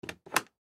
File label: Medicine Cabinet, Medicine Cabinet Close; Household; Cabinet Doors; Sound Effects:Doors